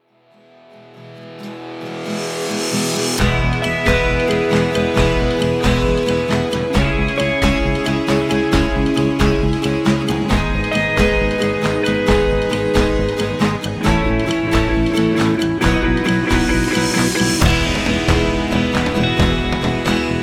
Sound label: Motivational Indie Rock; fun and energetic videos, vlogs, sports and party videos